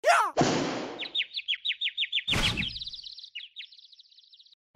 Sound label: bear punch